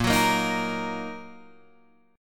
Bb Suspended 4th Sharp 5th, Bb, down, Guitar, Open G